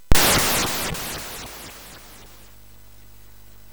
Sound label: Frantic, MOS6581R4